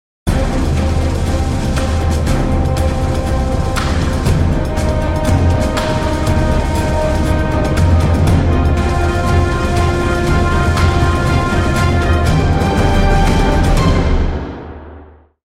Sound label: Epic Orchestral